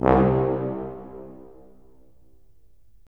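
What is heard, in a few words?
brass tuba; LA Composer vol. 3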